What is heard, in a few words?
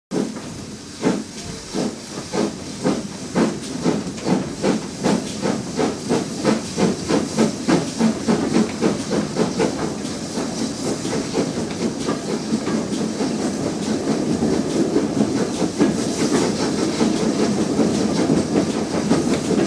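Steam Train Start Slow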